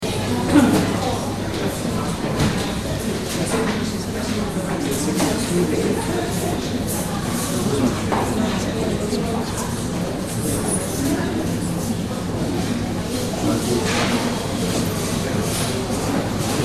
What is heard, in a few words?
crowd cafe